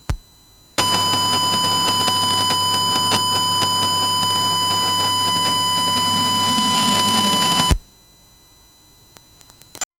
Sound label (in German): computer dsl leitung inte, internet